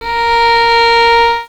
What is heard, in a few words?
vln